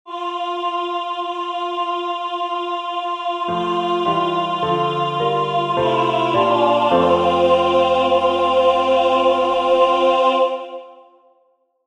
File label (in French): Alti Voix; Final Agur Jaunak